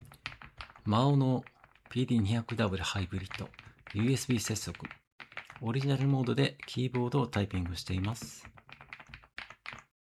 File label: maono pd200w hybrid usb typing sound